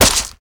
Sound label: alien claw flesh; civ13; civ14; Weapons; Xeno